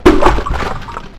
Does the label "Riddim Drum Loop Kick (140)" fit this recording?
No